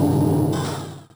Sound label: CLOSE; Monster Media Number 15; os2; warp